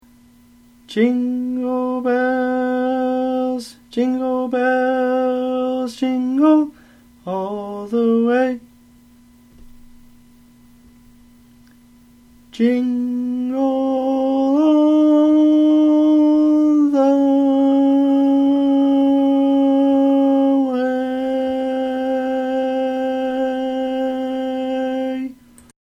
Tenor, barbershop tags

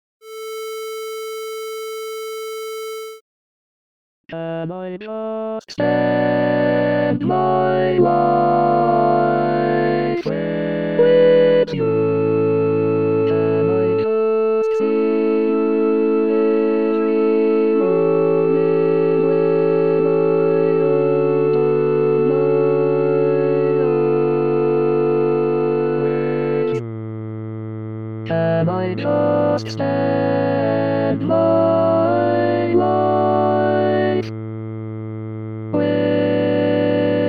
Tenor, barbershop

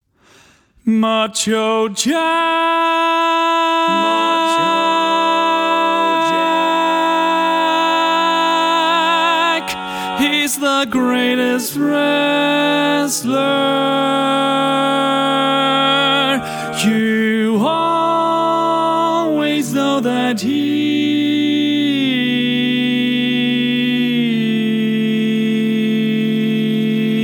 Macho Jack, Lead, barbershop tags

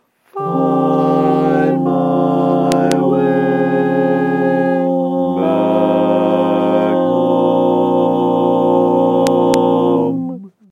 All Parts, barbershop tags

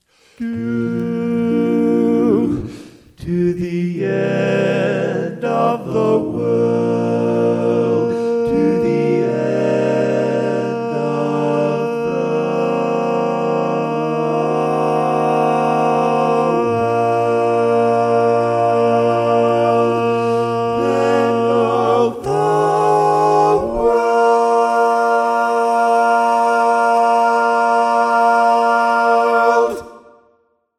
Lead, barbershop

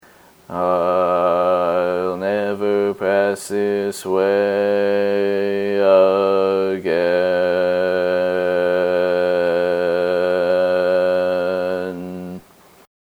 Bass, Barbershop Tags

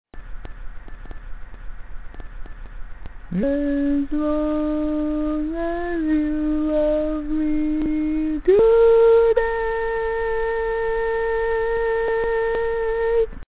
Tenor, barbershop

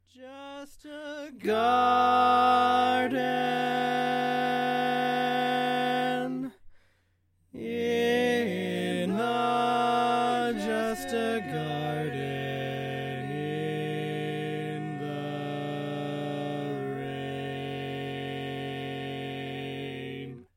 Bari, barbershop tags

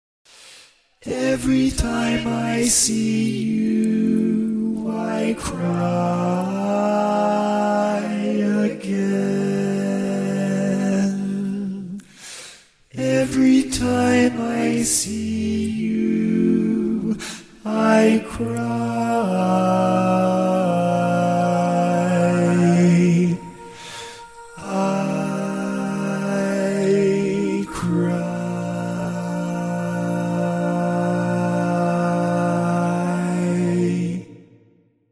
Bari, barbershop tags

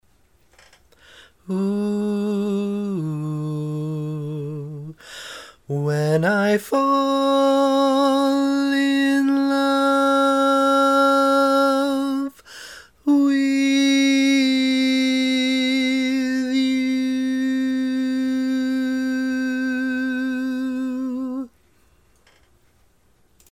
simple, Tenor, barbershop tags

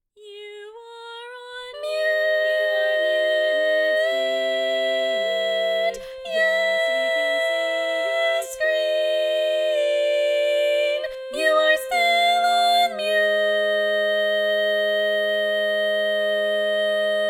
You Are On Mute, Tenor, Barbershop

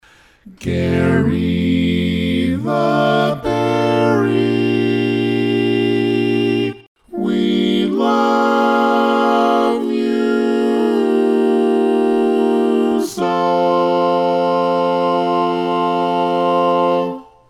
All Parts, barbershop tag

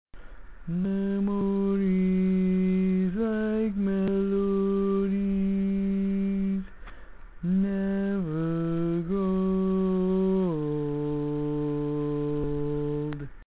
Bari, barbershop tags

